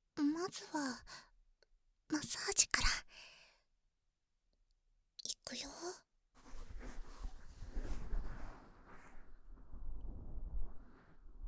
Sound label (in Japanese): 素手マッサージのみ, ASMR